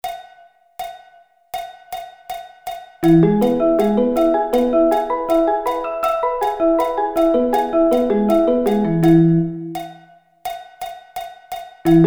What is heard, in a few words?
E Arpeggio Inversions